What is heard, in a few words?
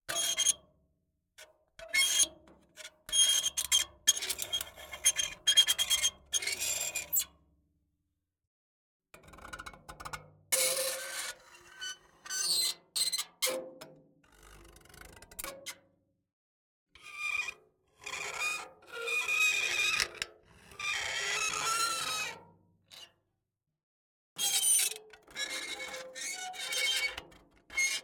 Metal Scrape Squeak Long; NT4; Soundopolis; Heavy Metal!